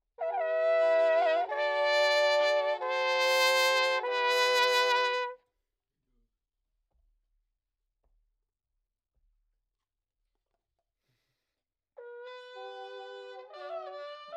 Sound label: Trumpet